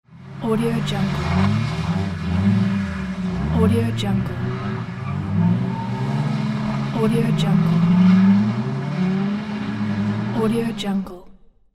race car starting interior; greenwaysound

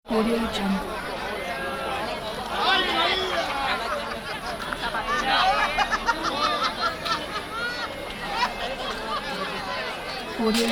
havana ambience; worldwidesounds

